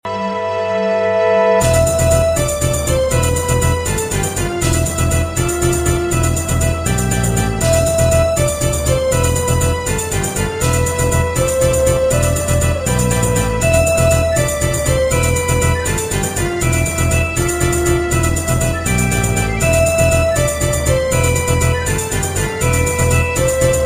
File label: musical trail fun phone tone ringtone